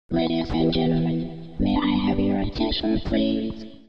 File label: FS1R speaking